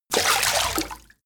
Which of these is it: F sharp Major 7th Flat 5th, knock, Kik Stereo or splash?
splash